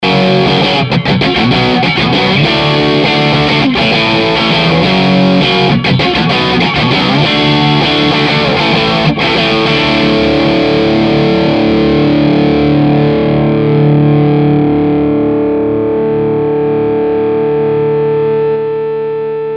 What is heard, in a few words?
Bridge pup Crunch